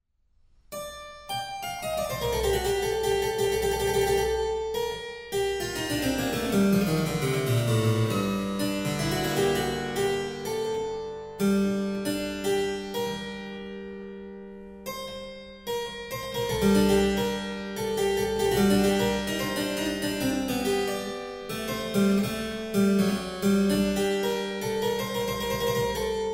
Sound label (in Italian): toccata, cembalo e organo; Toccata Prima; frescobaldi; Il primo libro di toccate d'intavolatura